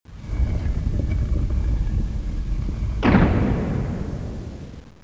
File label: stn door; duke nukem total meltdown